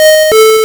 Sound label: Hard PCM Chip; chiptune